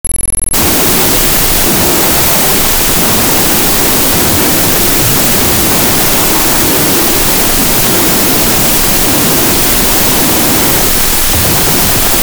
Footsteps; 1001 Sound Effects; Foley

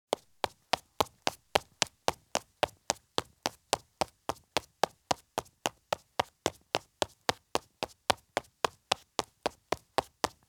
Light Running Shoes Run on Concrete; Sound Ideas; Footstep Sound Effects

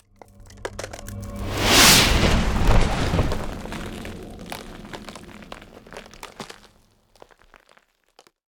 Ice Spell Whoosh, Cold Whooshes; Zero; Arctic Aura

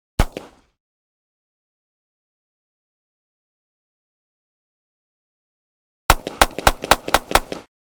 .22 Pistol Blast Medium, Rapid Fire; Hollywood Edge; Heavily Armed

